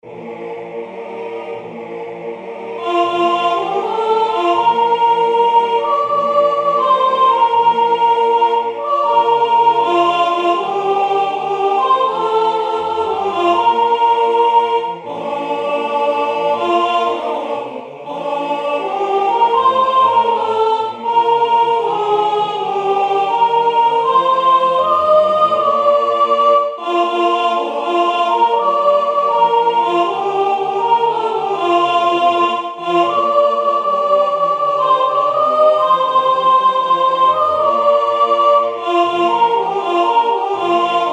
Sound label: soprano; Partons la mer est belle